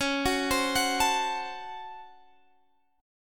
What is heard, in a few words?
C sharp Major 7th Suspended 4th Sharp 5th, C sharp, Guitar, Drop D